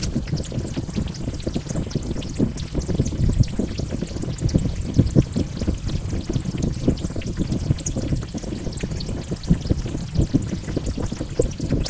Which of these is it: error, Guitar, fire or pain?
fire